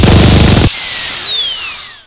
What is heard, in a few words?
minigun